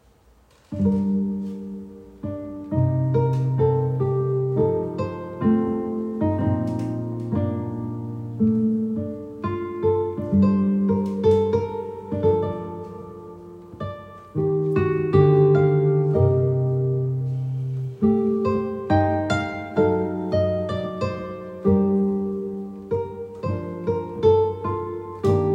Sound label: Guitar FX; covers